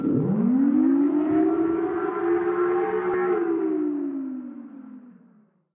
Air raid siren

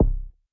Button click

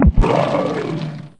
Death bubble out

